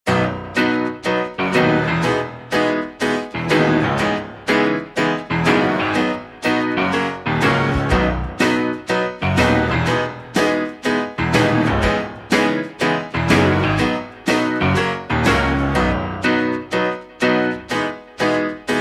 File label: (B To Db) No Backing Vocals